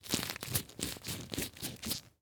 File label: Latex Glove; Blastwave